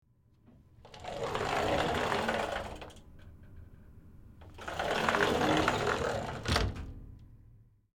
Glass Shower Or Mirror Closet Door Slide Open And Close With Reverb; Sliding